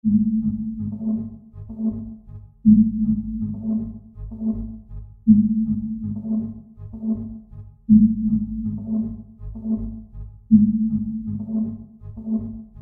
sonar type sound

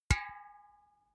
empty can hit hard

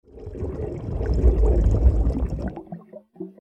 bubbling mid freq

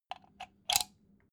socket pull plug soft

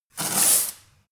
broom sweep close

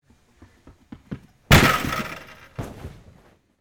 men springboard

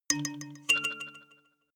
notification kalimba delay fifth up

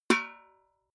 metal bucket hit ring small

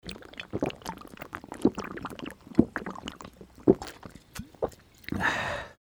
drinking water

